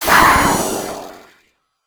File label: imp die, monsters; Doom